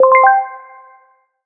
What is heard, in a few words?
match join; muni muni! v1.1